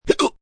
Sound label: Hiccup Goofy, Hiccup, male, goofy, comical (human voice); Hiccupping; VoiceBox